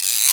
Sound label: guiro long, drum machines